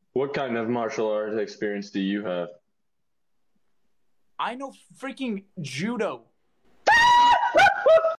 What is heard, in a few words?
laugh